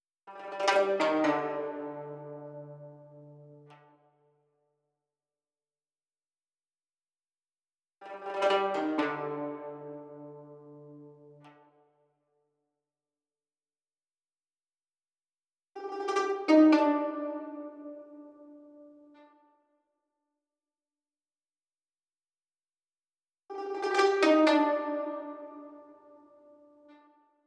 124 BPM C Min Guzheng; Cymatics; Destiny Gold Expansion; Mount Fuji

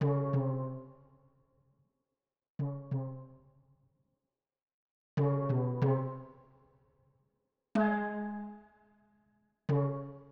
Steel Drums, Hip Hop Exotica, 093 Amin; Big Fish Audio

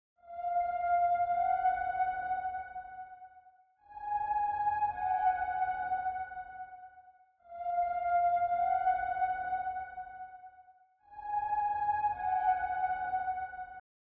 133 BPM D# Min Ambience; Cymatics; Utopia; Destiny Gold Expansion

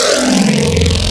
Growl Table, Wavetables; Cymatics; Essentials Wavetables; Serum

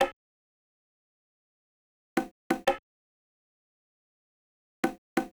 Congas, 090 Cmin; Hip Hop; Hip Hop Exotica; Apple Loops; Big Fish Audio

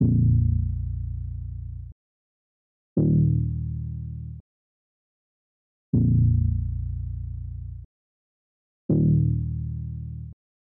81 BPM A# Min Bass; Cymatics; Destiny Gold Expansion; Orchid

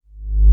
Reverse Kick Hit, Bmin; Hip Hop; Hip Hop Exotica; Apple Loops; Big Fish Audio

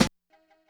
Snare, 085 Cmin

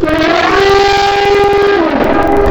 Elephant, Wavetables; Cymatics; Serum; Animals for Serum - Gold Edition

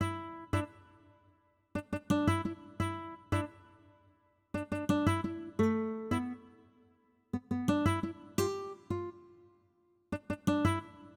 Guitar, Hip Hop Exotica, 086 Emin; Apple Loops; Big Fish Audio

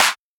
EDM Future Clap; Big EDM; Future House Tools; Claps

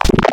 Scruncher, Growl Wavetables; Cymatics; Virus Wavetables; Serum

